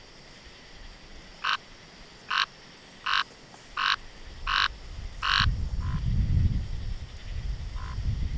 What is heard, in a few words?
Scinax x signatus; Dewynter